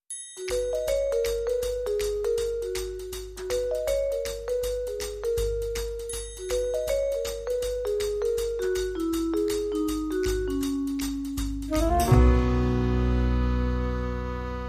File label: Chitter Chatter, Sting